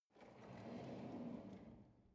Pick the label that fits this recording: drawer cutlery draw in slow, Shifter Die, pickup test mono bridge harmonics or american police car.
drawer cutlery draw in slow